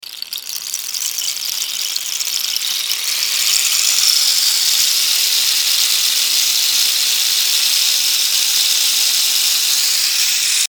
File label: whoosh